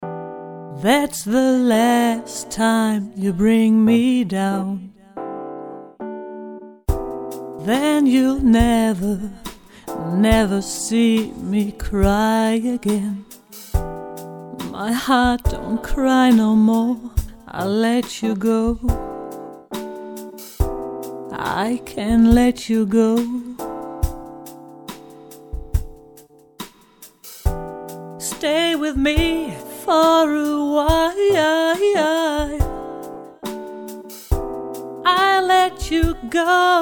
Female Vocals

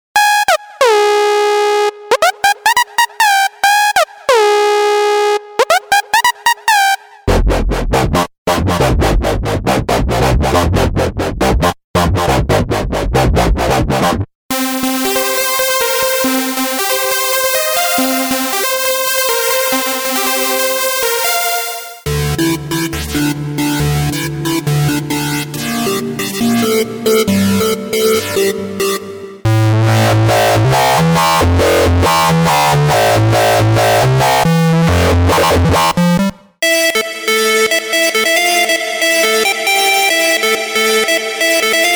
Dubstep Massive Leads; Dubstep SX For NI Massive

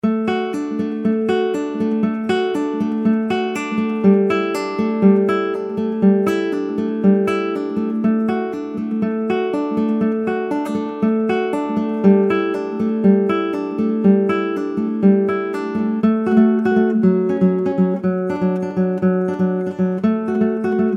Nylon String Arps

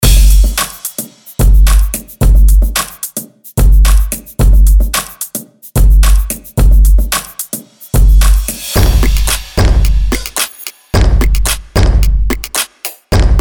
Designed Drums

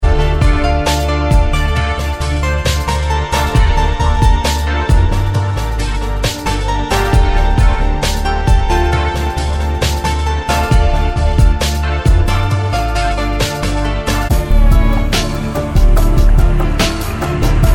Downtempo Darkness

